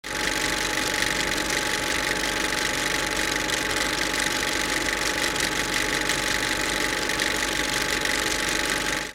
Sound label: projector, anime